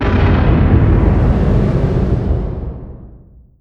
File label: distance super heavy battleship defend; Hearts of Iron IV Death or Dishonor